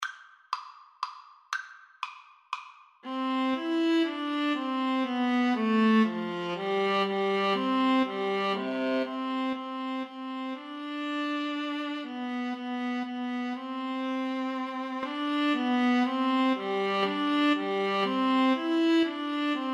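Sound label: menuett violas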